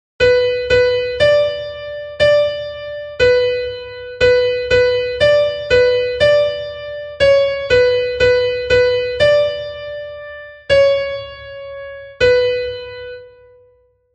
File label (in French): vitesse lente 60bpm; 1° étape